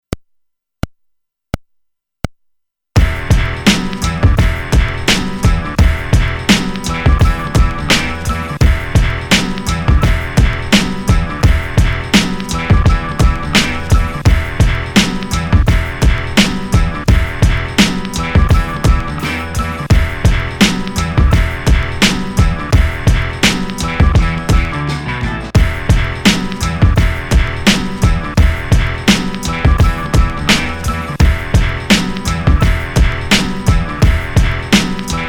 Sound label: Beat